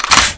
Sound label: m1887, weapons